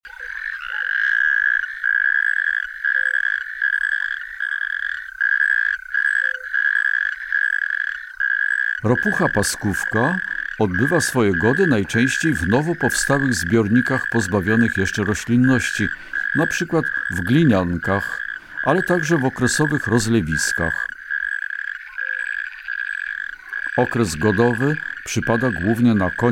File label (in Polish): Ropucha piaskówka; Kurs PTTK; Przyroda; Tajemnice puszczy